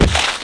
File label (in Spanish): paso mino arena; blade